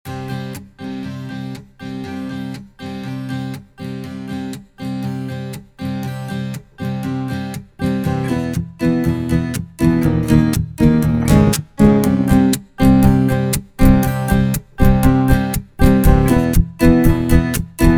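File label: Unmix Drums, Strummed Acoustic; Automation; Gitarre; Zynaptiq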